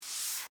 Office, Chalkboard, Erase, Chalk, Eraser, Single, Stroke, Classroom; Miscellaneous Office; BlastWave